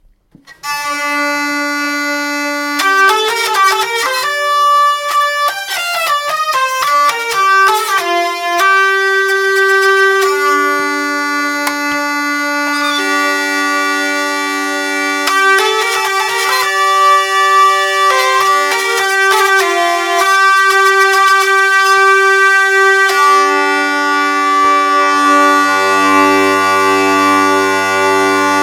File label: euphonia vielle